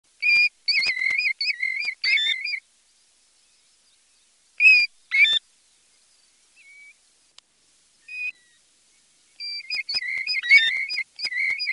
Piviere tortolino

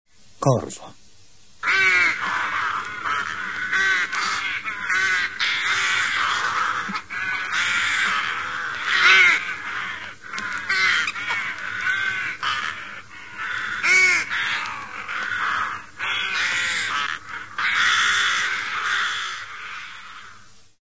Corvo, uccelli

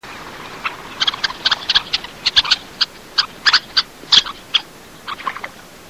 Falaropo beccosottile

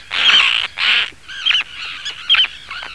Sterna di Dougall

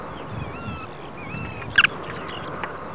Pernice di mare orientale

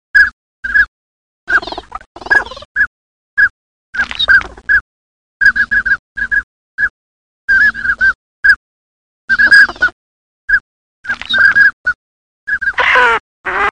Codone comune

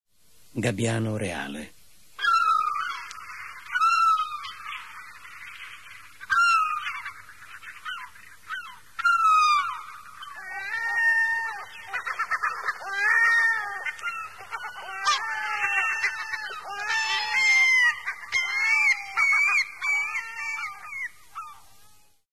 Gabbiano reale mediterraneo

